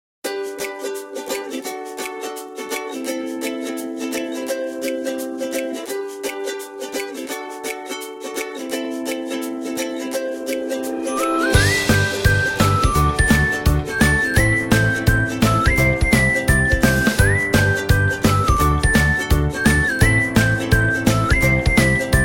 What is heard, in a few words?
Happy Ukulele Corporate